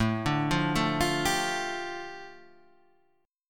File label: A 7th Suspended 4th Sharp 5th, A, down